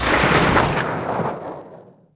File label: turret echo